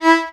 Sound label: VIOL E3, VIOLON, Arabian Traditions; USB Soundscan vol.43; AKAI